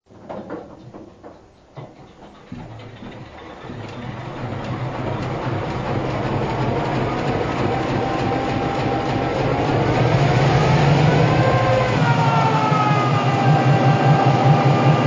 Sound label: GP7